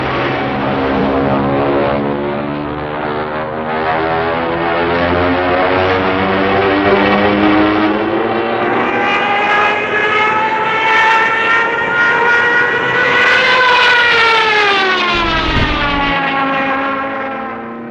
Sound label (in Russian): zvuki istrebitelya vtoroj mirovoj vojni